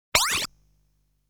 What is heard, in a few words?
Missile sound; legend of hero tonma